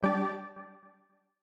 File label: close menu; astro Legends Lyra and Erion Desktop; Foxium